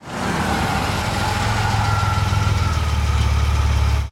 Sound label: stop out, cars